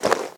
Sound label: armor light; smite; chiron